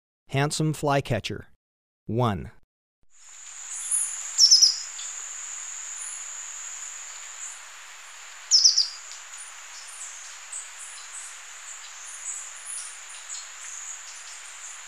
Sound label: Handsome Flycatcher, Birds of Ecuador; S. America